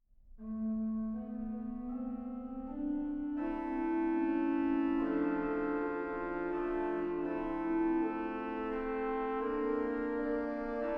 preludio corale, organist; Carlotta Ferrari; wenn meine sund

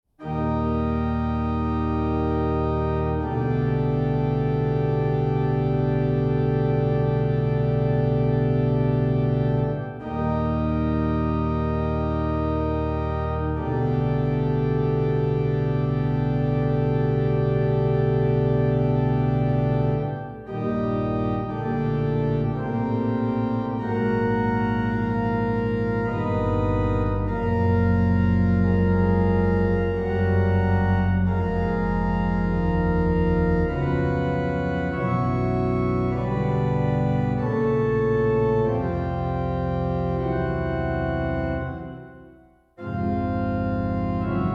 hymn, organist

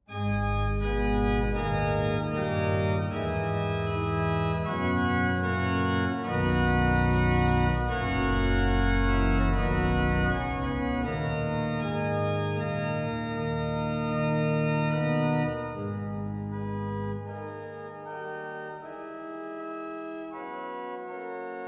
postlude in g minor, organist

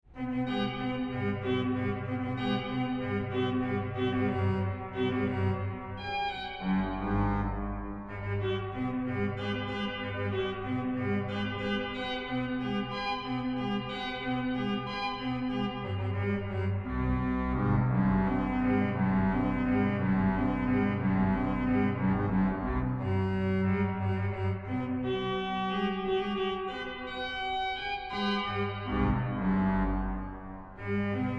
allegro scherzando, organist; Andreas Willscher

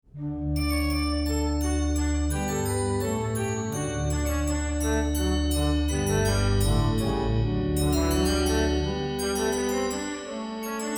trumpet tune, organist

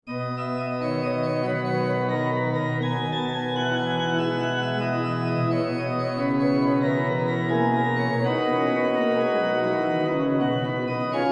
prelude, organist; after BWV 939

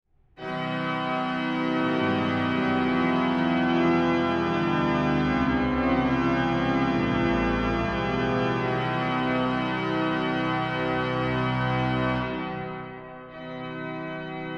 majestic postlude in f minor, organist

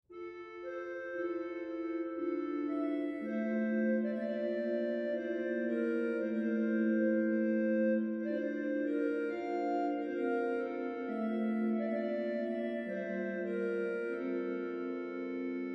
melodia, organist

